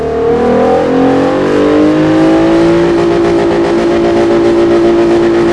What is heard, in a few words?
no shift, vehicles; auditt